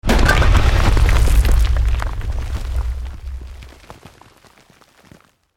EXPLOSION MEDIUM STONE ROCK

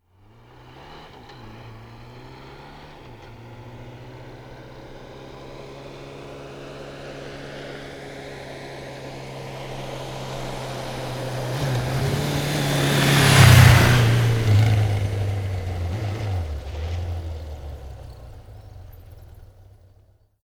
Motorbike Suzuki 1100 Up and Pass Medium Speed; Stop in distance; Motorcycle

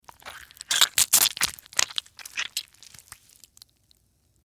Sci Fi Alien Egg Pod Rip Open Juicy; Blastwave

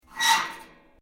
Metal plates scrape; Materials; Foundation

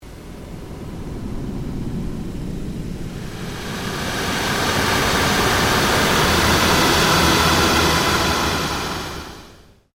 PULSE LONG SWEEP

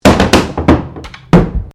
FALLING CANDLE ON PIANO; Common, Domestic, Home, Household, - Domestic - Artificial; Machinery Sound Effects

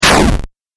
8 Bit Style Damage; Video Game Sound Effects

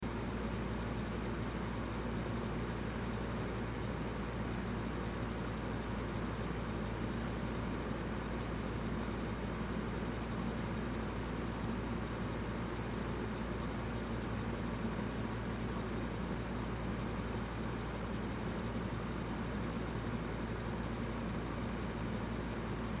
Ceiling Fan Medium; Ceiling Fan Medium Loop; Fans; Household; Blastwave

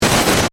Static Burst Stutter, Static, Burst, Stutter; Production Element, Imaging Element; Accent, Transition; Static Sound Effects; BlastWave

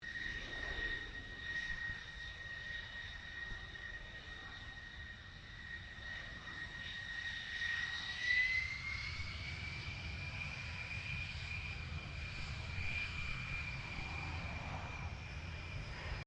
AIROPLANE JETFIGHTER F-111 GROUND MANEAUVERING; Airplanes; Vehicles; Avalon Airshow 2009

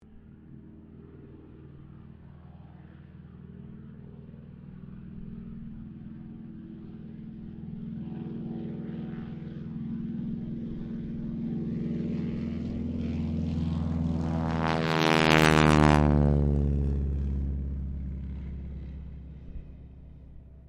AIRCRAFT AT-6D HARVARD MK III TAKEOFF STEREO; Temora Harvard MK III; Airplanes

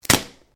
Branches Dead Snap

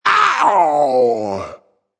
Vocal Deep Ow 110

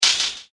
Metal Wire Brush Hit With Heavy Reverb Delay; impact sound effect; Metallic Sound Effects

